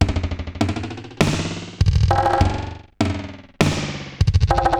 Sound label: Digital Delay RX17 (100BPM), cyborg beats; musicradar